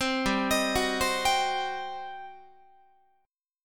G Major 7th Suspended 4th Sharp 5th, G, down, Guitar; GM7sus4#5; arpeggiated; 15,15,18,16,16,18